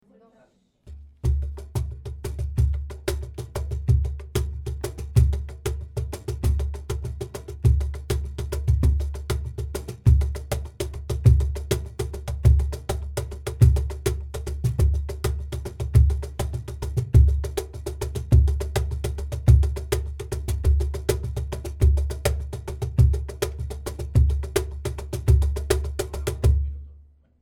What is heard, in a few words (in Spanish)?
Sonorización Cajón